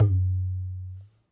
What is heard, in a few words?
Ghe Open, Tabla; ETHNIC and WORLD PERCUSSION; Tabla Kit Large